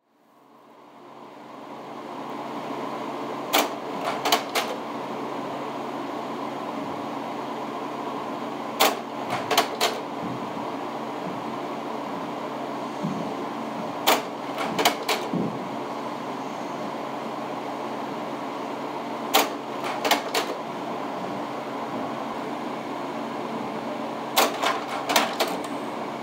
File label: Slide Projector